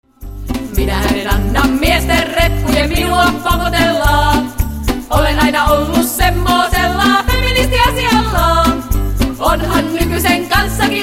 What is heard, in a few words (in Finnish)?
Humppa